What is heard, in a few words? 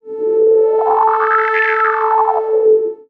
Sweep; Diamaudix Audio; PAS II